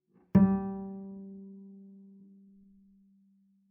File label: Bass pizz ff sul G G3, Double Bass; MIS Pitches - 2014; Strings